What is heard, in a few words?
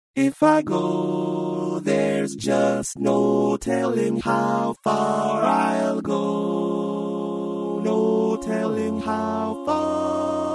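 Baritone, barbershop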